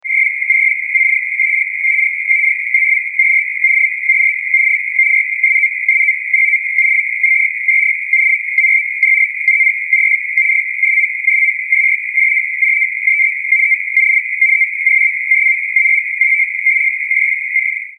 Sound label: sitor a